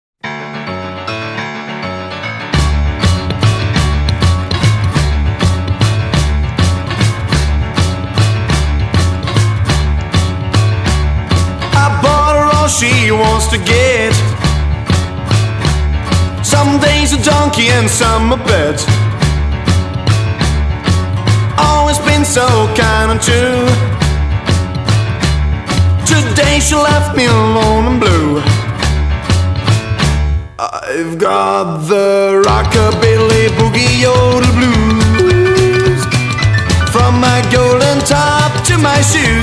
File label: Rockabilly Boogie Yodel Blues